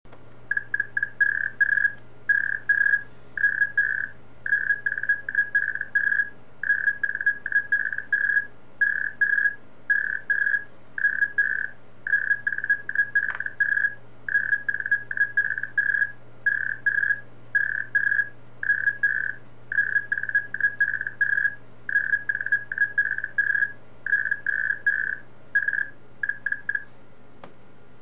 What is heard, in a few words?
hellschreiben